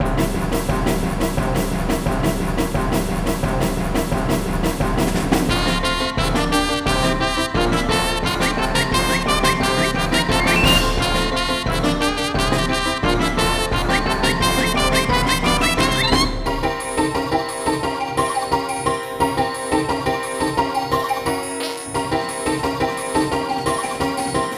BGM Competition; Fantasy Life; 3DS gamerip 2012

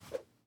throw; teardown; ps5 windows xbox series xs

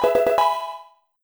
Level Up!; Pokémon HeartGold and SoulSilver

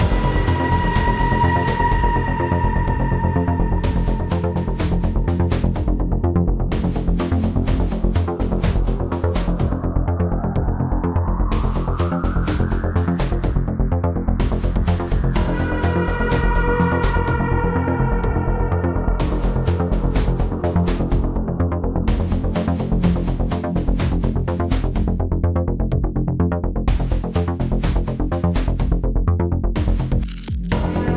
Music Main Menu; It Came From Space and Ate Our Brains

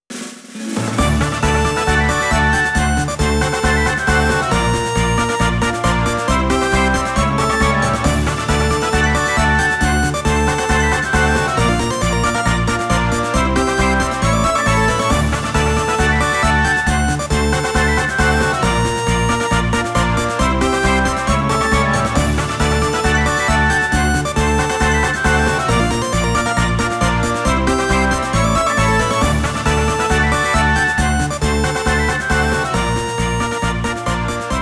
Jingle Introquiz Result; Taiko no Tatsujin Atsumete Tomodachi Daisakusen 2015; Wii U

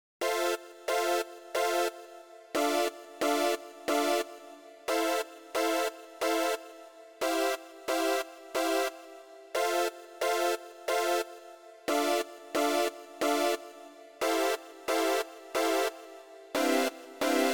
Poly Stacks

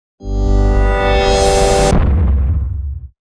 Cave Music, Memory Dark Swell G

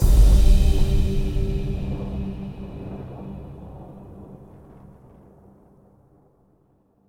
Combo G; Mixolumia

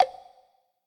Click

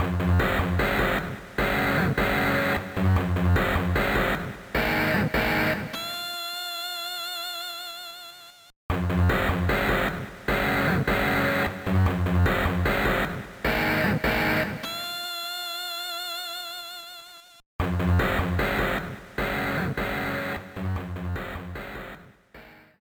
Guitar; Guitar Study Creativity 5

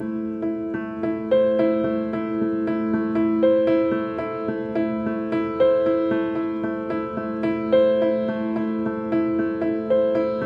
Music Level Sadness Layer Piano

